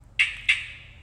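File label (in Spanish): desactivacion, ringtones de alarmas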